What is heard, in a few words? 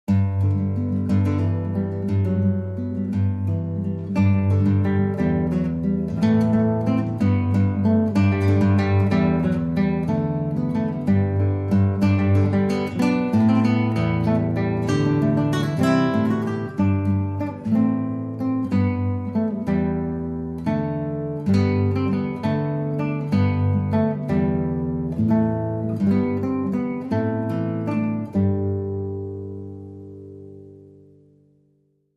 Solo Guitar